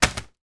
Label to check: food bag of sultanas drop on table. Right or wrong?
Right